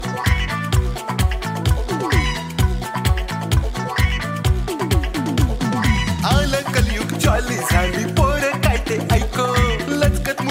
marathi music ringtone

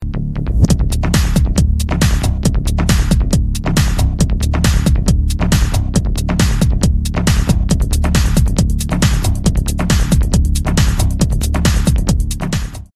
amazing dance ringtone; bollywood ringtones

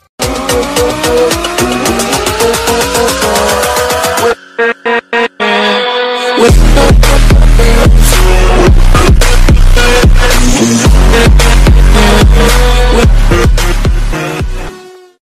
english music ringtone; english ringtones

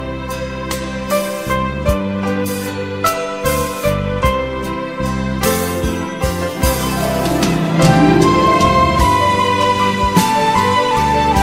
latest music ringtone, bollywood ringtones

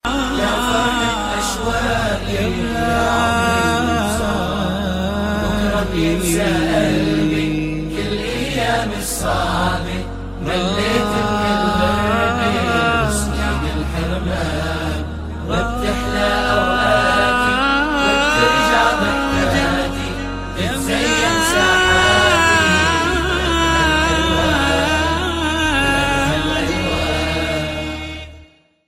nasheed islamic ringtone

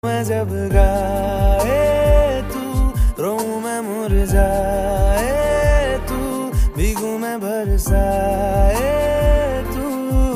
new bollywood ringtone

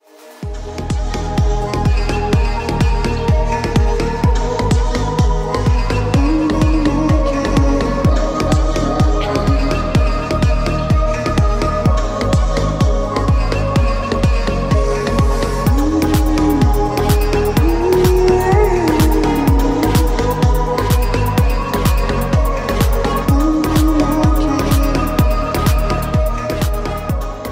dance and rock ringtone; english ringtones

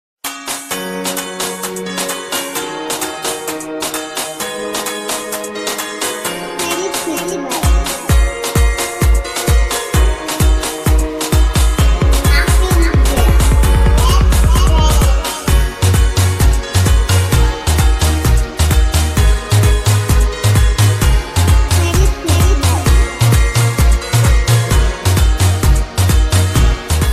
christmas ringtone

